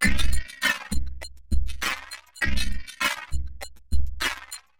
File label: Robo Beat 100BPM, sci-fi Beats